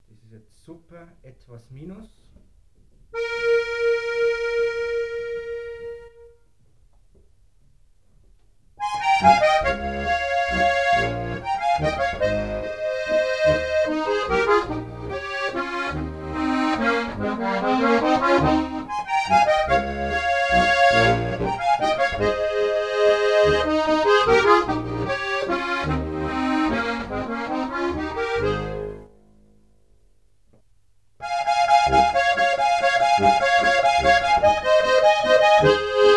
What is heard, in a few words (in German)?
Tremolos, Super etwas Minus